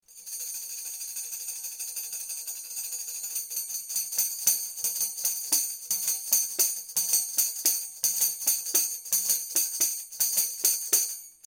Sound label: panderetta